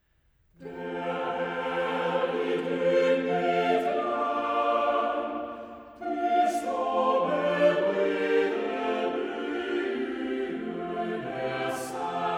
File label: there is a lovely land choir; der er et yndigt land